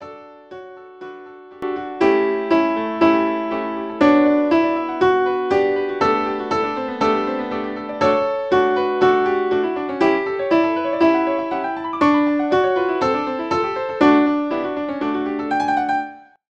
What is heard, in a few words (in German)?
haenschen klein barock; bandora creator